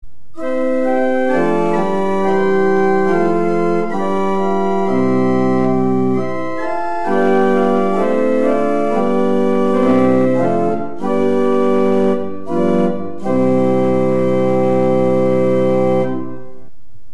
orgel, Holpijp 8', Prestant 4', Quint 3'

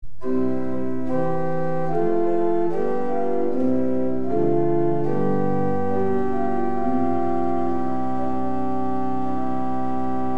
orgel, Prestant 8'; Geluidsfragmenten hoofdwerk

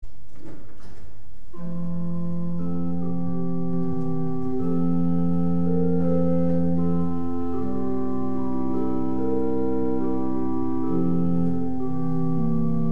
orgel, Roerfluit 8'

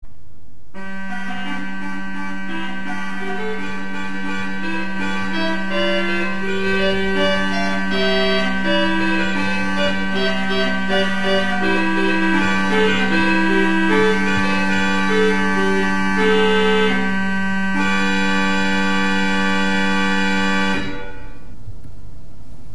orgel, Dulciaan 8'; Vier orgelwerken; organist: Cees-Willem van Vliet

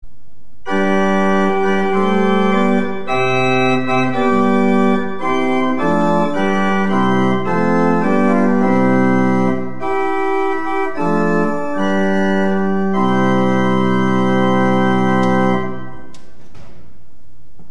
orgel, Holpijp 8', Roerfluit 4', Quint 3', W2 (?), Nassard 1 1/2

